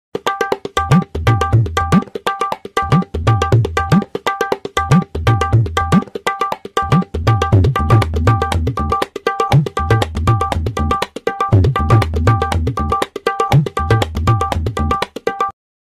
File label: tabla verb